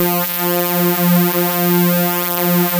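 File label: RAVE synth F4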